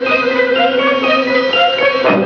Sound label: oldtime suspense; ORCHESTRAL and CLASSICAL